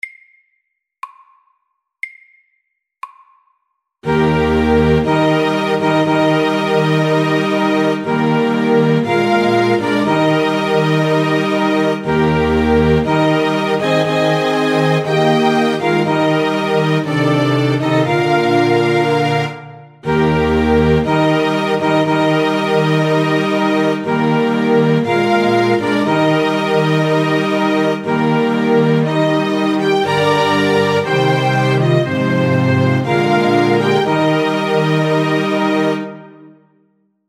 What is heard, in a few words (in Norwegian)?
Brudemarsj SLOW 60